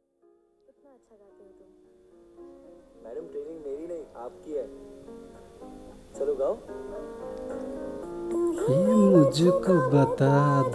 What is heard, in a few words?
Love Song Hindi